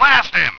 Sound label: die